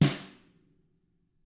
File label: TAMA BR F, Drums; ILIO; Double Platinum Drums 1